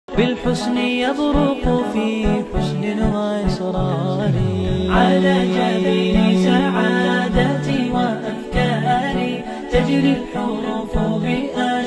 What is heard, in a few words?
Arabic ringtone